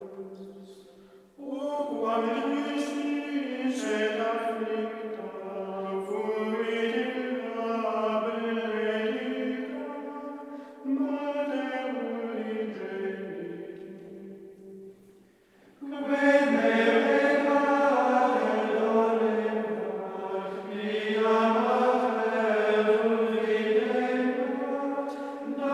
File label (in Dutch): Koor (mannen) Gregoriaans; Stabat Mater